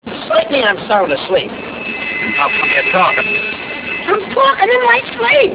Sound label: sleep